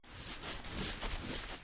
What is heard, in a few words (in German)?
putzen